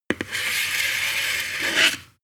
sword scabbard draw slow

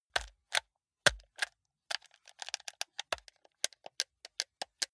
console controller play sequence hard

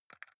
console controller xbox arrow key press fast

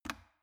plastic water boiler turn switch on hard

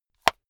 watch case small close hard

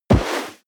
body fall leather snow

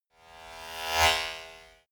servo small whoosh

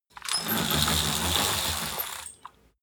roll-down curtain roll up

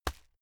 hit plastic fleshy soft

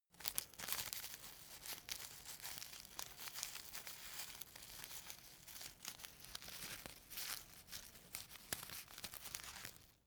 cigarette spread tobacco

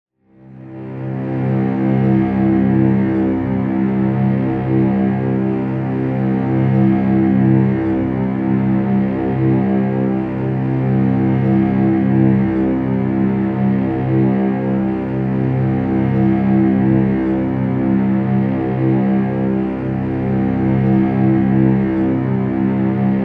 dark string tone

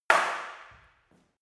staff wood fighting single hard

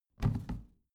plastic trash can open heavy

